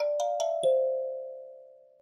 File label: notification; SP6